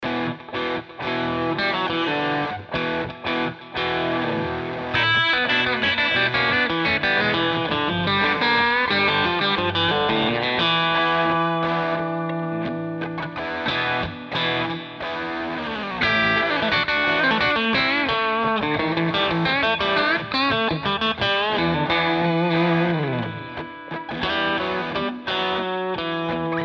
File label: ele guitar